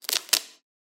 taping; soundscrate

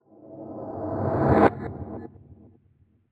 Haunting Reverse Spell; SoundsCrate

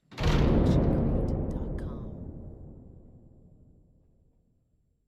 Eerie Echoey Rumble Hit; SoundsCrate

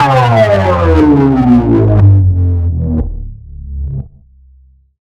Bass Drop; SoundsCrate

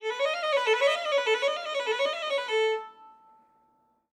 Violin Whole Note Runs

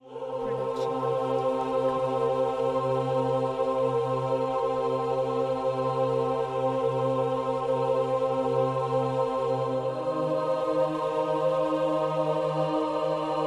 epic choir; soundscrate

